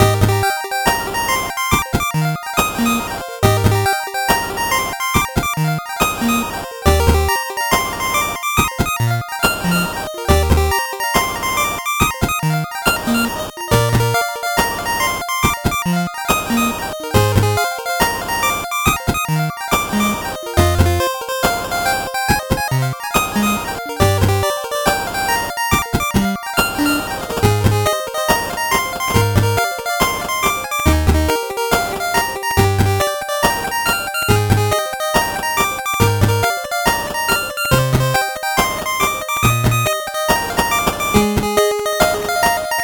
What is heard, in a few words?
8bit bgm normal